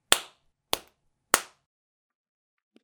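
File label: slap sound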